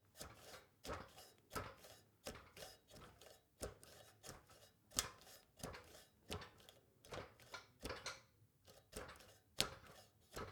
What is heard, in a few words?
step ladder metal soft; schritte